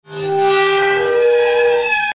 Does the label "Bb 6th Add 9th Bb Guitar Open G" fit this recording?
No